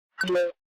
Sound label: close